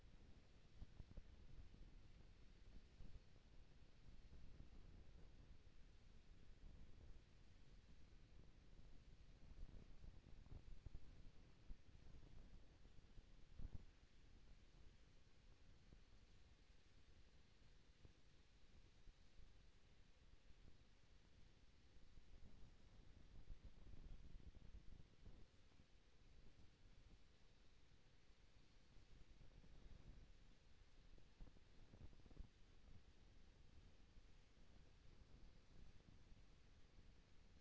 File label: HYDROPHONE 16000Hz; JASCO AMAR HYDROPHONE 740